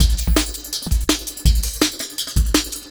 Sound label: Beat, Futurist Drum & Bass; Drums & Percussion